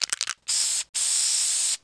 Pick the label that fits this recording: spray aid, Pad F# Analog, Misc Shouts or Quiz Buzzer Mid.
spray aid